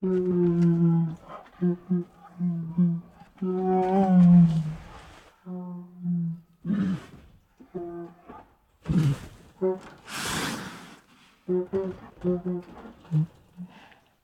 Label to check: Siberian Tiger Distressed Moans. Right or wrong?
Right